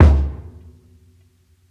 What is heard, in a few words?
KICK HARD; ONE SHOTS; KICKS; breaks; Basement drums vol 2